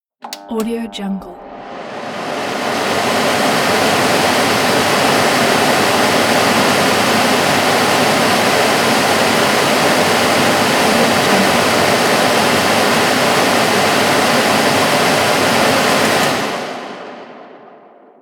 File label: extractor fan